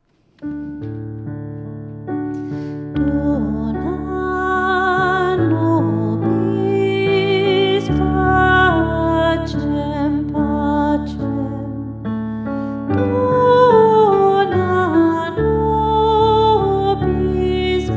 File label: Dona nobis pacem trad